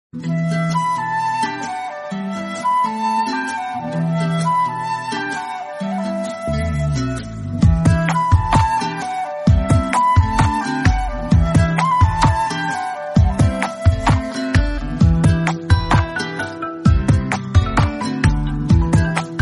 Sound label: background music; savaari